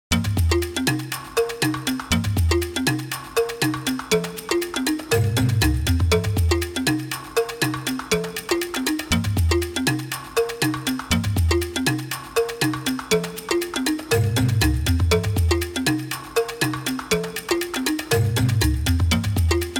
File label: Jungle Minimalist; Jingles medley